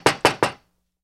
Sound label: Knocks On Glass; Light Knocking On Glass Door. - Knocking On Glass